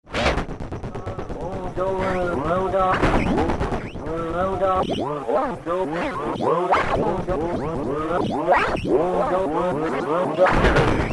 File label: Harpsistair